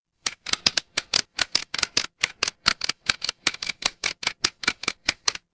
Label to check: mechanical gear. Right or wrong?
Right